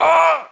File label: pain